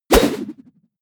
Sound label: whoosh throw; swooshes-swishes